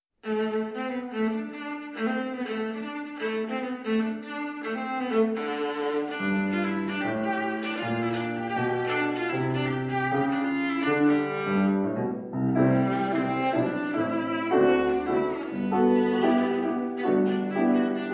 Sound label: Rhythm